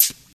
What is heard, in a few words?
clean hi hat c sharp key